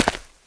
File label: Footstep Dirt; Guard